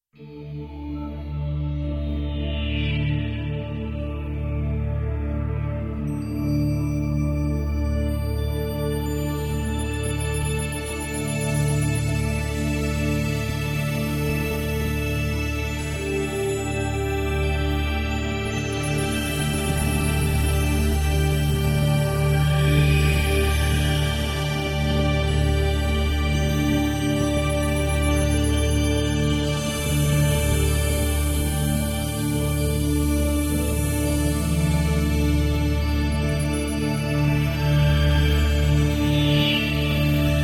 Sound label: chimes crescendo long